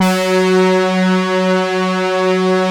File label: BRASS, PADS; Masterbits; Vintage Voltage